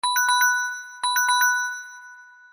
samsung s17, ringtone

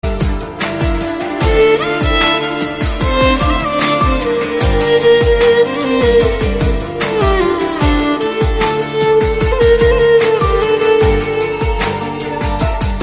instrumental, ringtone

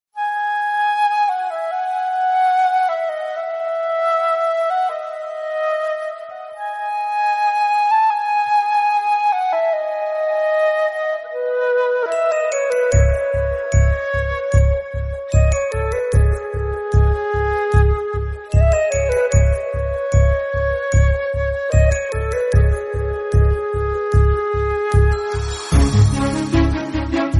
sad ring tone

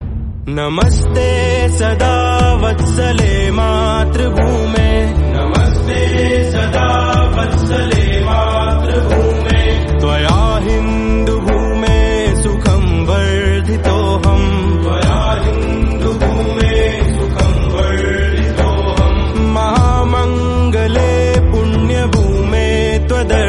prayer musical version; rss

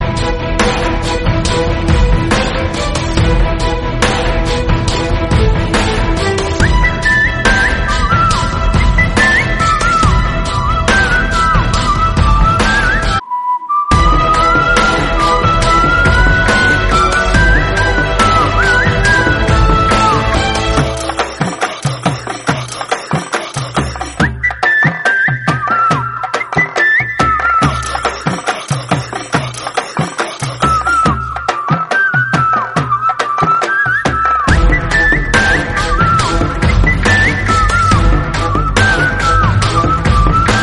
kathakali whistle

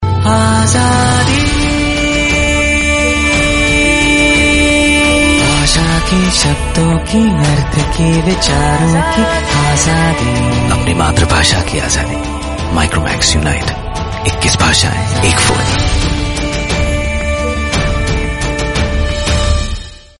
micromax unit 21, ringtone

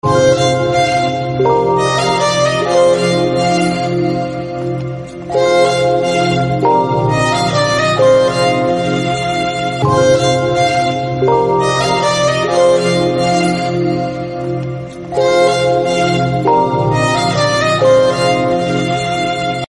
violin

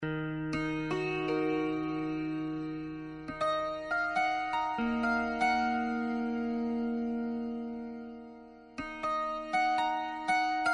sweet santoor, ringtone

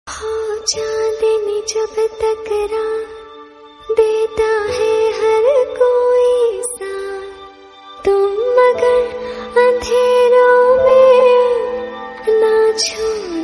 female version

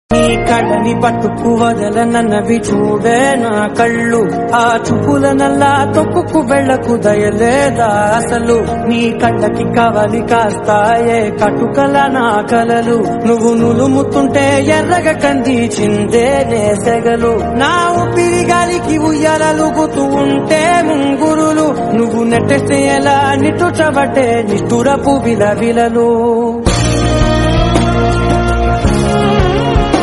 beautiful love song

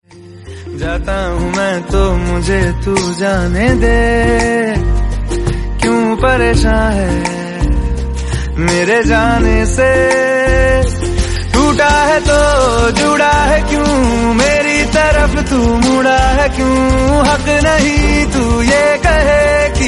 bollywood song

